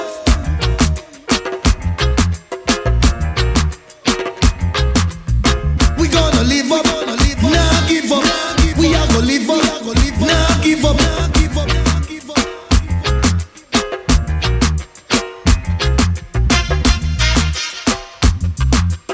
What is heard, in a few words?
Dub